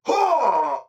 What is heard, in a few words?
Grunt Hit; Androxus